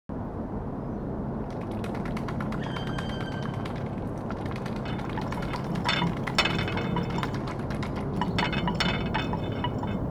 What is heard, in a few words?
irregular rythm contact mic auto instrument; the interaction of sound ws